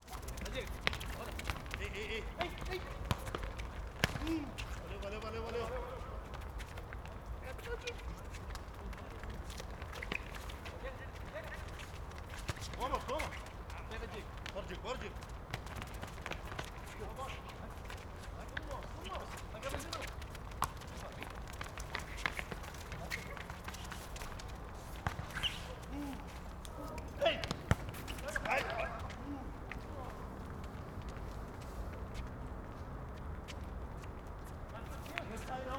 Quadra de futebol garotos jogando gritos vozerio transito longe; Cerrado

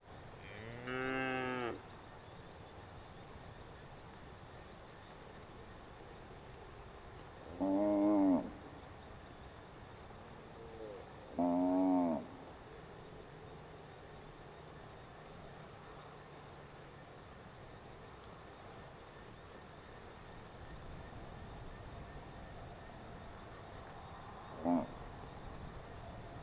Vacas pastando e mugindo; animais; Coleção Sonora do Cerrado

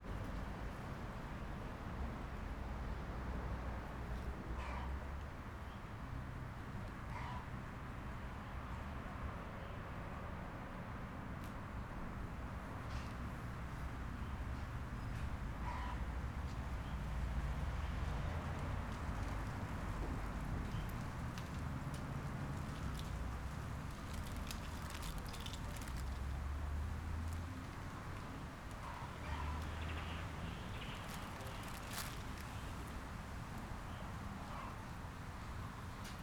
Ambiente em rua residencial com transito longe galinha dia; ambiencia domestica

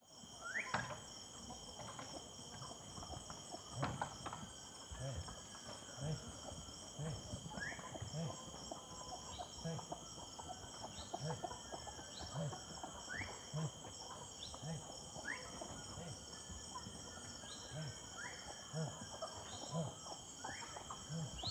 Boiadero Chamando Gado para Curral na Sede da Fazenda; Seriemas; ambiencia rural

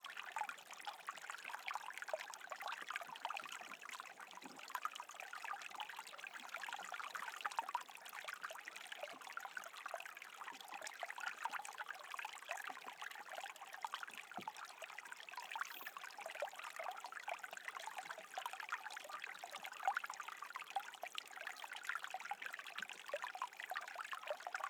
Nascente com pequena corredeira em percepcao bem estereo; Cerrado

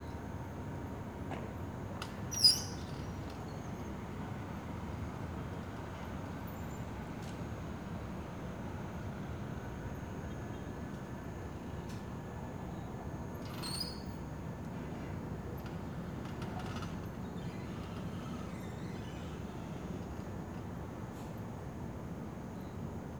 Janela basculante abrindo e porta de carro batendo residencial externa; noite goiania; Colecao Sonora do Cerrado

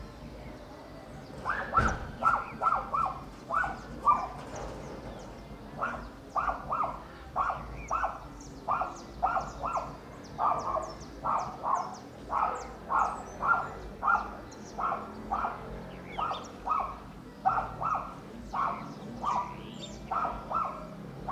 Moto ligando e indo embora cachorro latindo; alto paraiso de goias

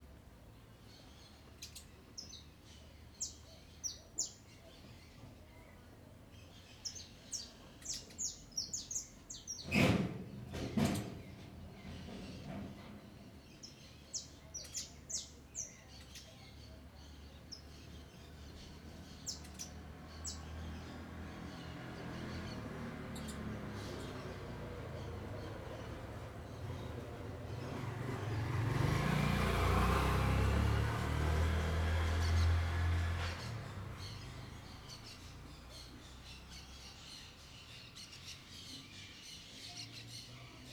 Ambiencia quintal de cidade pequena de dia com passaros, eletrodomestico e; moto; ambiencia domestica

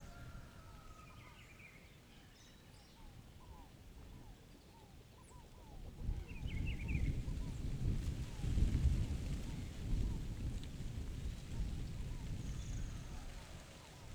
Vento em ambiente rural de manha com galo cantando e passaros

